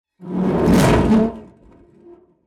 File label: Cinematic metal whoosh